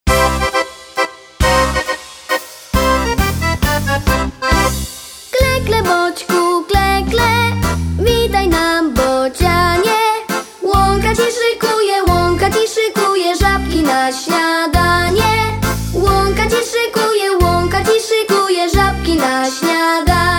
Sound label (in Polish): Piosenka Bociek